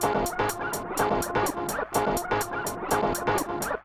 Hardcore Breakbeat, 125 Emin; Big Fish Audio; Hardcore Breakbeat Action; Marsattax

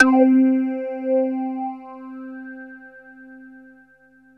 BASS A, MORPHEUS; Syntec; Wall of Sounds

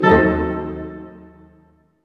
HIT, Tutti Hits; String Master Series; Dynamic Orch

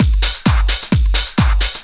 drum 130 trance

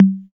808 TOM; East West - Phat & Phunky CD2; 90's Sample CDs

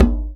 Djembe; EMU Orbit-3

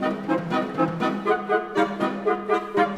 Orchestral Loops; 128 VERTIGO

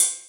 Ride; Equipped Music; Loungin House

